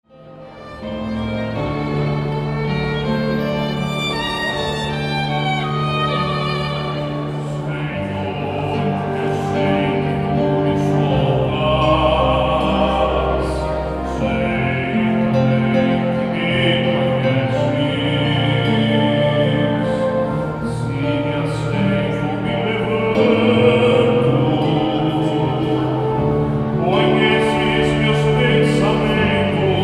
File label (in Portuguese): TENOR E ORQUESTRA